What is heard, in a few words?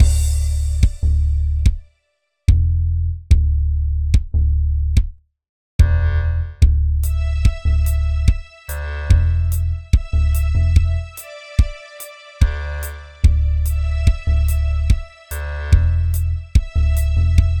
menu loop; atelier godot; AlphaRaquette